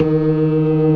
CLASSIC C3, Synth and Organ

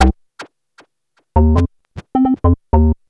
Bass, Futurist Drum & Bass; Sonic Foundry (Sony Creative Software)

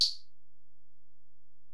HiHat, Alesis DM-PRO

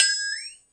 Metal, Percussive FX; musicradar; cartoon caper

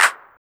RSS PERC

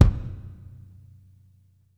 GATED VERB, KICK DRUM; Sampleheads; New York City Drumworks VOL-1; SP KICK DRUM

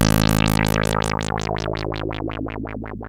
2600 G#1, ARP 2600; Hollywood Edge - Giorgio Moroder Rare Synthesizer Collection

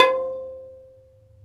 PERC, Dance Mega Drums; Best Service; PERC MIX

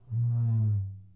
Box Drum; Sony CS

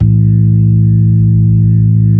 B-3 Club Xfade, Keyboards of The 60's and 70's

